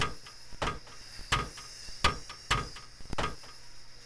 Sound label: mg overheat, weapons; dod 1.3; dod enhancement pack